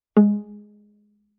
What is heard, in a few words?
Viola pizz ff sul C Ab3; MIS Pitches - 2014; Strings